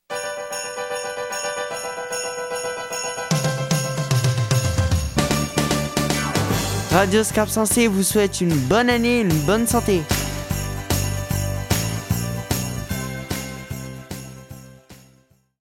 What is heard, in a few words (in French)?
JINGLE Fetes